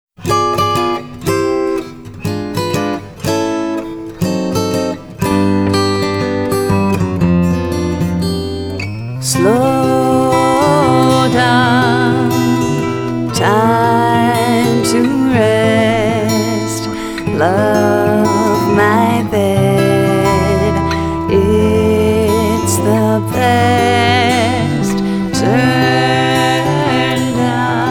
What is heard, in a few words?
Sleepy Song